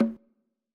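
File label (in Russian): бонго звук звонкий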